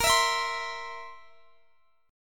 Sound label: Bb Suspended 2nd Flat 5th Bb down Guitar Open D